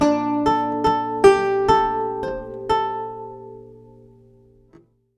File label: Lute Performance; Lute Anim